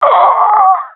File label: death; Counter Strike; CS:GO; professional epic